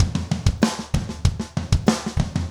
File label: rock toms 90